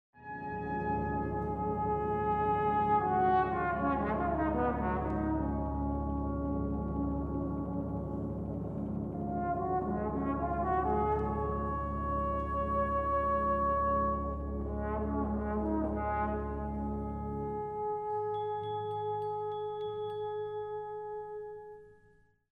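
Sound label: trombone cad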